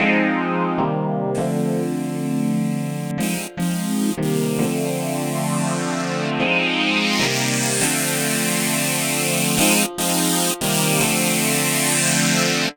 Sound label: Synth Ravetec; Sonic Foundry (Sony Creative Software); Knutrix Drum & Bass